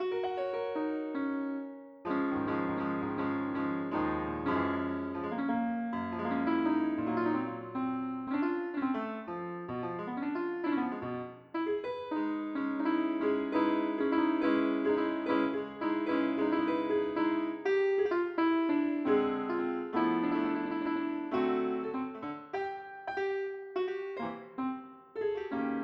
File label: piano